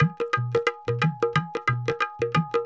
Percussion, Indian

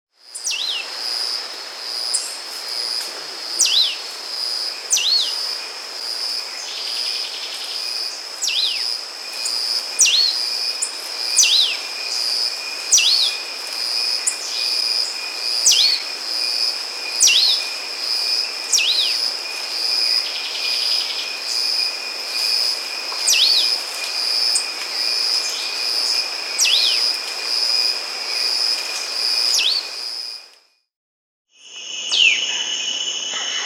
White-winged Shrike-Tanager; Lanio v; S. America

